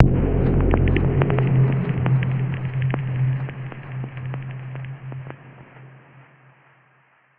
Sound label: Radiation, Ambient; SFX; Ambient - General